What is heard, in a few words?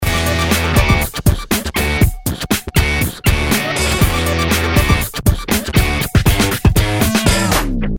pitch down; cubase